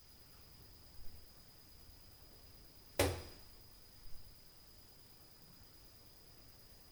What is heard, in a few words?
TAC NOISE, Night Noise